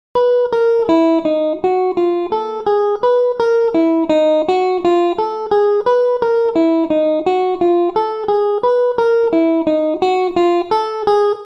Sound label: spider pattern, guitar; spidertech